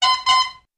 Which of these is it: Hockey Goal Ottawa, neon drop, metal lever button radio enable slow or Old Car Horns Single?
Old Car Horns Single